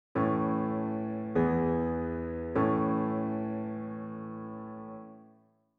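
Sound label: A major PAC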